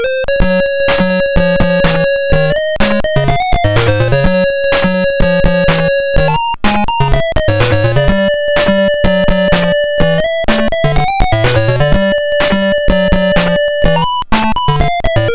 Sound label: Mission Completed; mercs; atari st